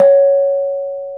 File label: KENONG, Bronze Gamelan; E-MU Formula 4000 Series Vol. 4; Earth Tones